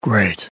jeers

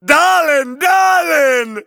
grooves positive; tf; a hat in time

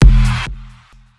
boombox jump; pets

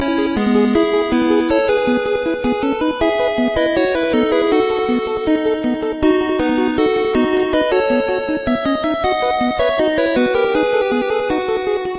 arcade loop; tf

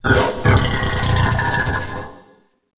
wretch tunnels amb alert; A Machine for Pigs

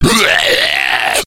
death, slender shiver

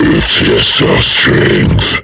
alert; kfsmr flesh clown

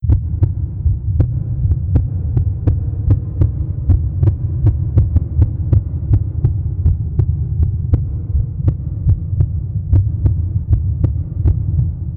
heart normal

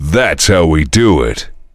heavy singing; tf; duke

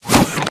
jump; tf; tf2pets; juicebot

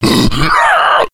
attack, slender shiver

